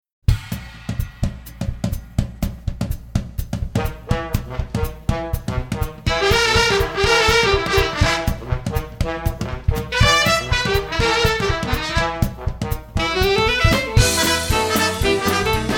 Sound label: Swing Orchestra